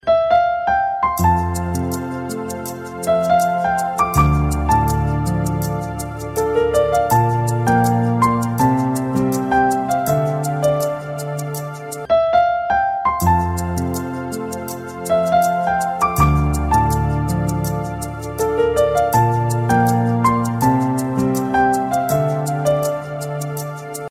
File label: india romance